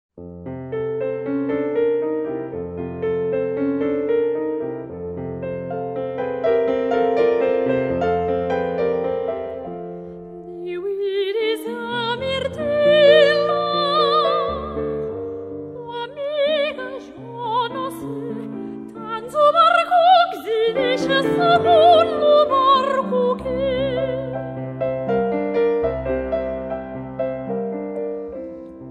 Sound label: Piano Veu